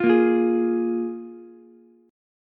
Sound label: F Minor Double Flat 5th F Ukulele; Fmbb5 strummed; JGuitar